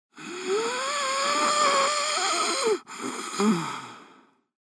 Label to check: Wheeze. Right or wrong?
Right